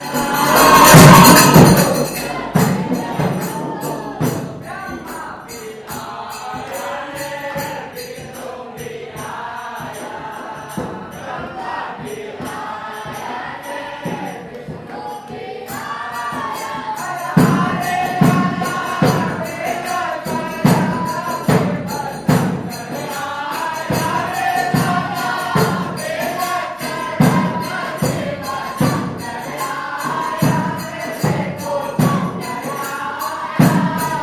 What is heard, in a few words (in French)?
Inde Udaipur messe dans le temple de Jagdish